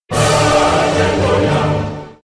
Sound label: Halleluja Remix